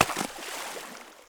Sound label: Splash; Blastmorph Pinball; xbox series xs